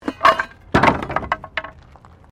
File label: Wood Metal Move